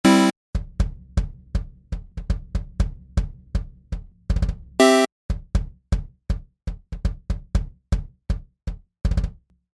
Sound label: Kick